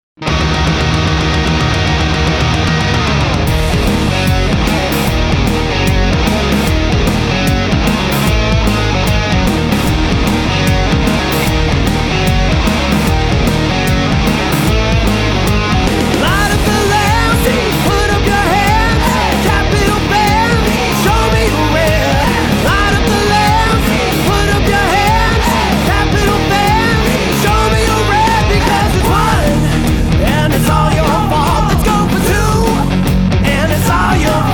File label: Capitals Chant